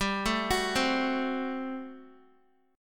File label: G Major 7th Suspended 2nd Suspended 4th G down Guitar; GM7sus2sus4; arpeggiated